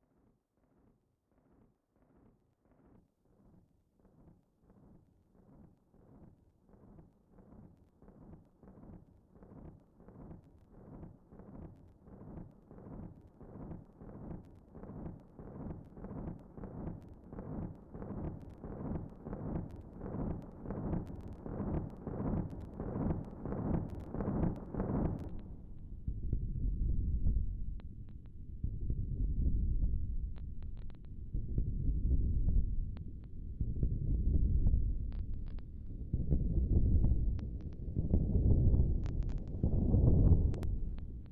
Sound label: thunder